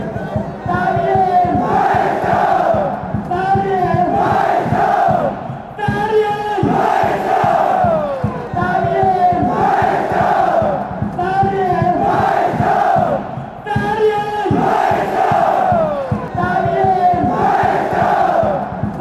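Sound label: football chants; daniel beichler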